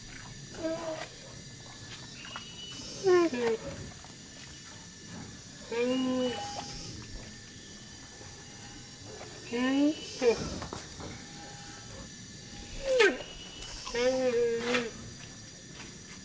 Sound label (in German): Nashorn